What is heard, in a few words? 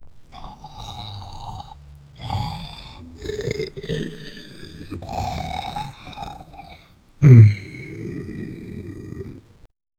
the sound of a monster, neck